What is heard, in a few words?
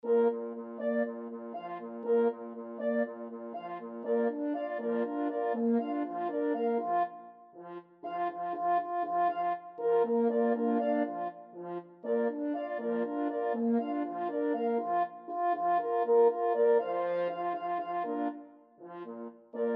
The Rhythm Horns